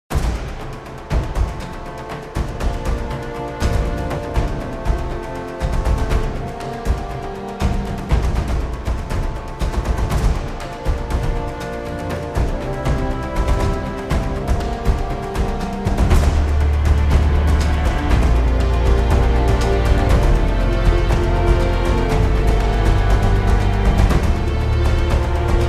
Victorious Ride (No Vocals)

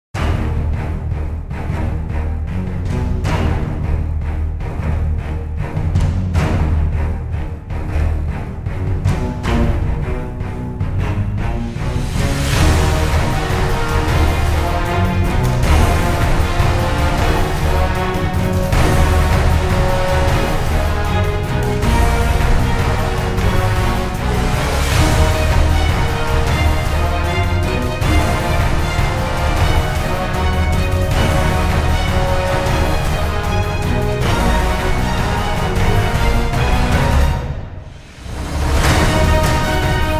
No Vocals

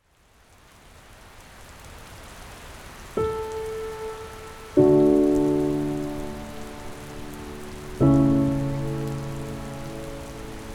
Piano, Piano & Rain

